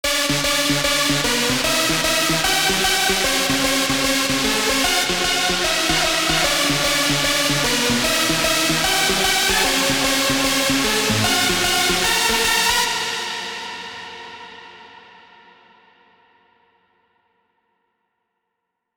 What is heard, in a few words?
Lead Hardstyle Sample